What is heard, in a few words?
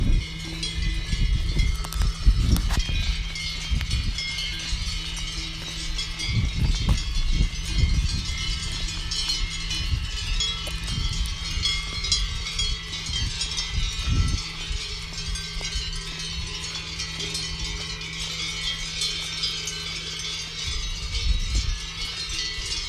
Cow Bells